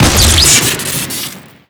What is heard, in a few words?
cannon shoot crit; weapons; loose cannon; garrysmod; tf2